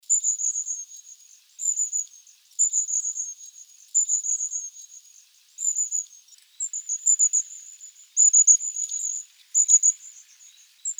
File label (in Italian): Codibugnolo; valsolda